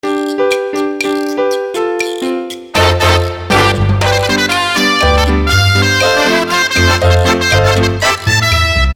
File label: Latin Segment Intro